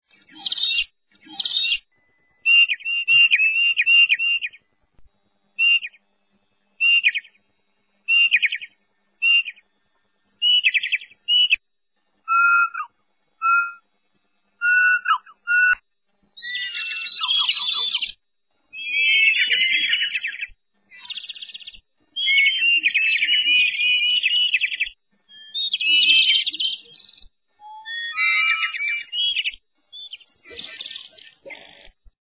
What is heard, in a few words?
dawn chorus